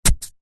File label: household garden wooden sharp gardening tool stabs into grass soil single